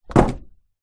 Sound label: foley card box packageing plastic window down on ground